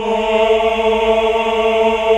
AAH; Best Service Gigapack 1

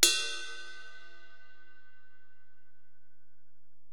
HiHat, Alesis DM-PRO

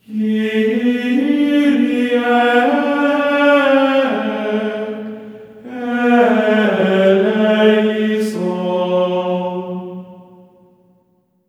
KYRIE B4, voices; Spectrasonics; Symphony of Voices; GR KYRIE

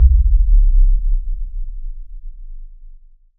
sub thump; Best Service Alpha Dance vol.2; ZAPS+THUMPS

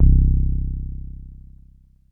Bass Synths hits and octaves

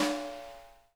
RIM; Sonic Reality; Interactive Drum Kits

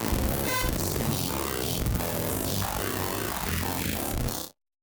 Malfo Syn, malfunctioning synth

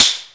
stick, handy percussion

